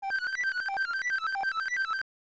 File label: Stereo Test; Netto Gekitou Quiztou; Namco NA-2; arcade